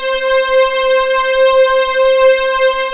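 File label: MKS80 STRINGS C5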